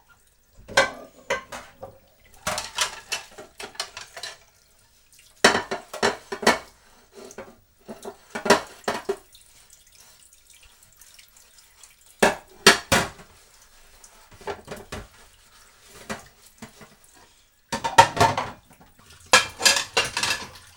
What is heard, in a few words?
Doing The Dishes Plates